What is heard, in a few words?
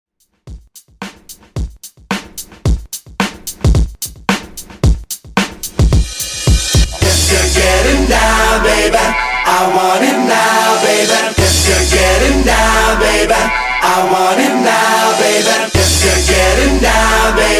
Redrum 110Bpm